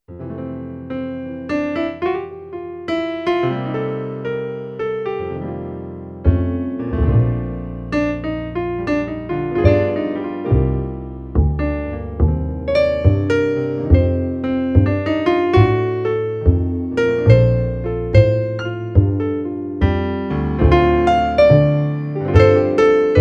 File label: melody